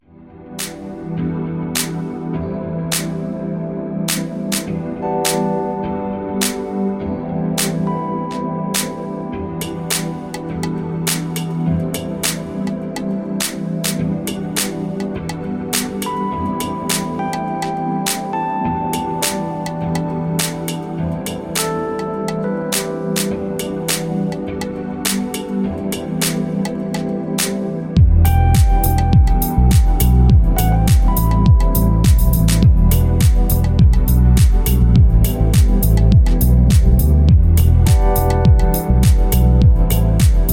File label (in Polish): łagodnego chilloutu; golden autumn